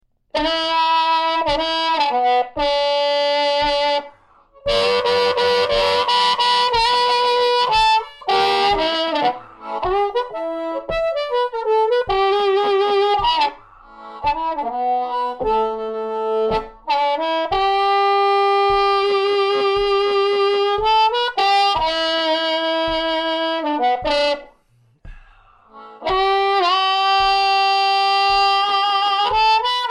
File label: Dry, harp